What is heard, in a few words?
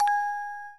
orgel